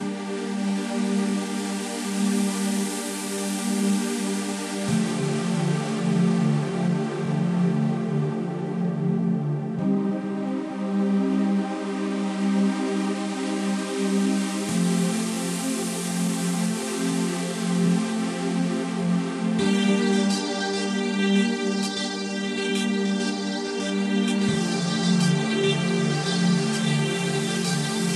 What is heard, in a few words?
enigmatic dance 432 Hz